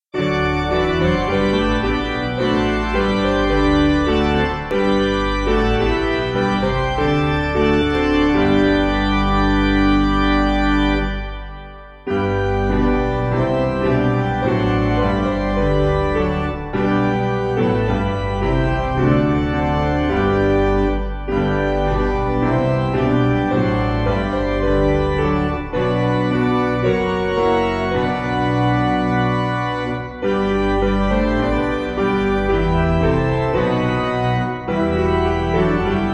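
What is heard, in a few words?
Organ Piano; Rho Dy Fendith; Brynawel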